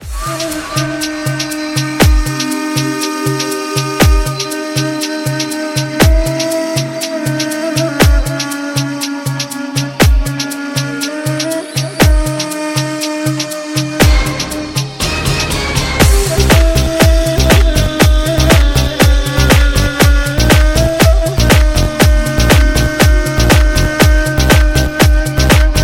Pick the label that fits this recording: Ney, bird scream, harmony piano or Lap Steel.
Ney